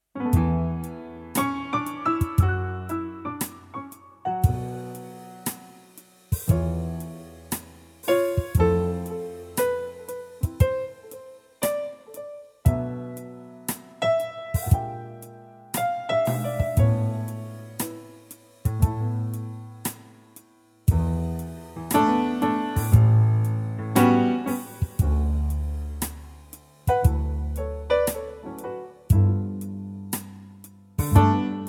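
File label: A to Bb groove, backing track; key - A to Bb - vocal range - F to G#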